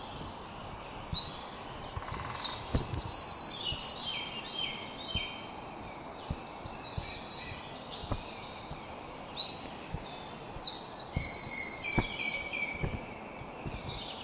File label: dawn chorus; RSPB magazine “Nature’s Home”